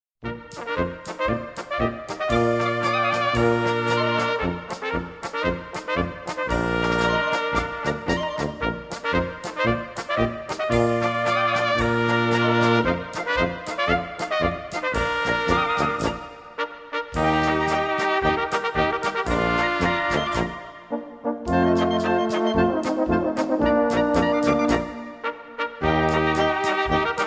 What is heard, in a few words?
Instrumental